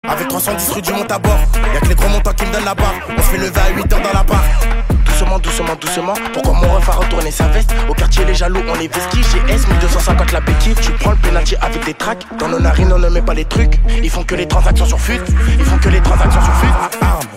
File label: HIPHOP